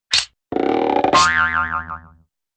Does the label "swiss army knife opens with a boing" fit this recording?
Yes